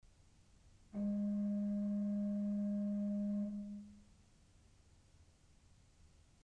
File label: Principal 4' GIS, pipes, ORGEL